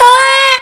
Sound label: normal hit whistle; osu